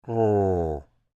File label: Creature Speak Groan Short, Human